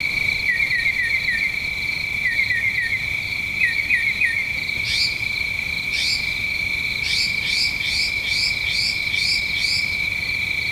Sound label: night bird loop; ambience